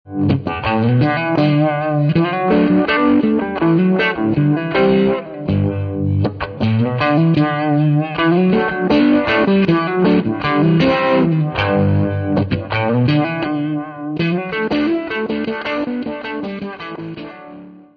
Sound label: neovibe, guitar